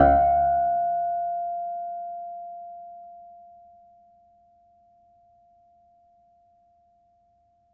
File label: Harm Mid, Piano Harmonics